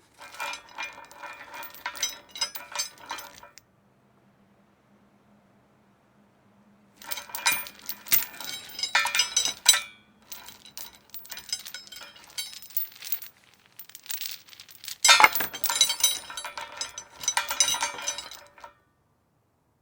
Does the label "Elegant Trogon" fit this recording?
No